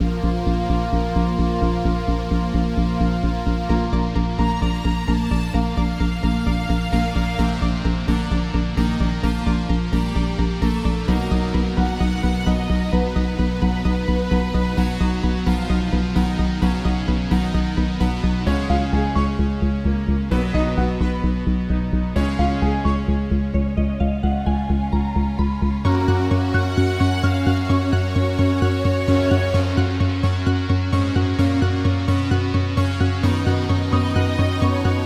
Lobby

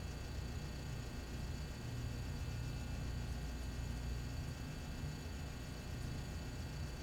ambience - diner; 60s bat diner; Lego Batman 3 Beyond Gotham

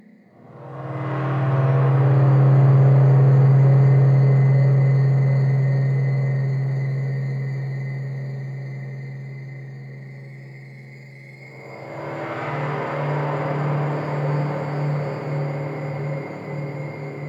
Core Ambience; soma gamerip 2015

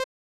Toggle Option; Space Invaders; SNES

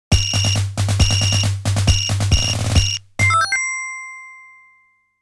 MARCH (STAGE CLEAR); Irem Retro Game Music Collection 2

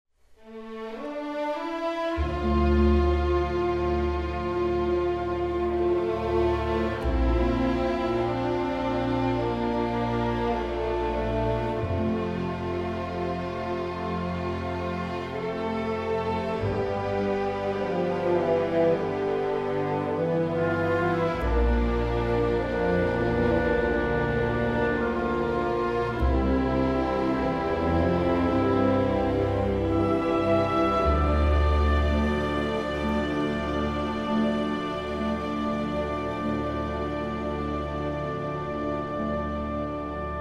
Tranquil; Evil Genius

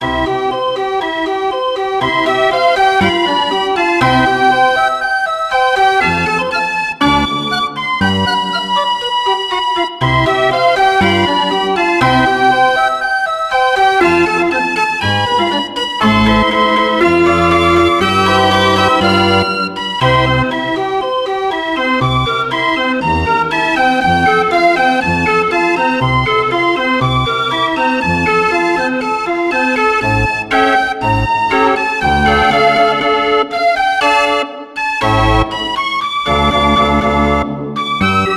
Keyboard Instrument; Assassin's Creed Syndicate

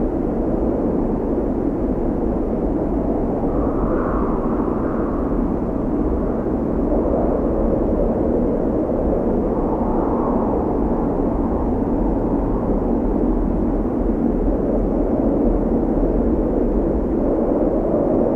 Wind canyon

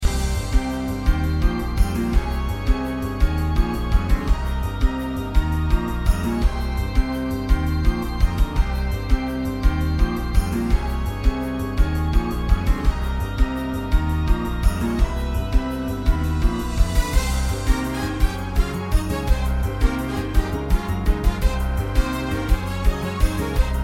Boss Battle Loop

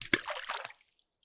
Eject splash; Among Us

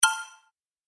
Game Notification; Infernal Radiation

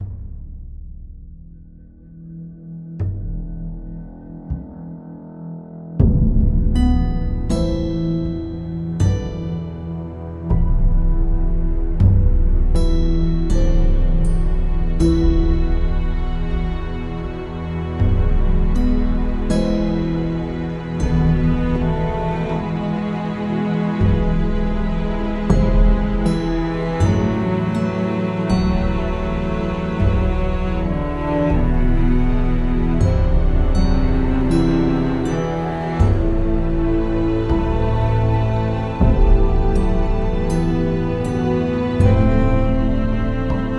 Loop Neutral

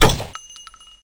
SVD

